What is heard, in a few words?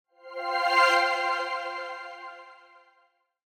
Swell Synth; Audio Toolkit